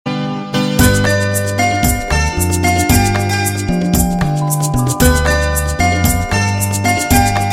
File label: Romantic Tone